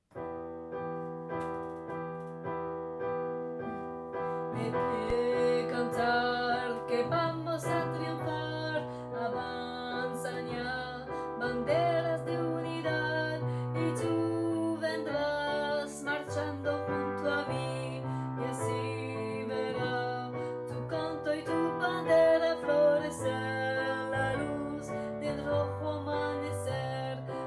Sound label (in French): pour alti; pupitre alti; enregistrements de travail